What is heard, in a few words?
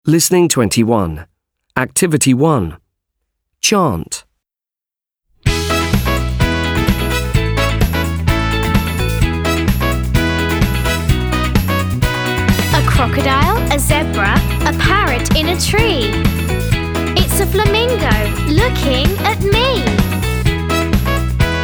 Wild Animals CHANT